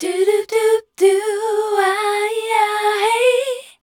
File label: MEL F#, Voice Spectral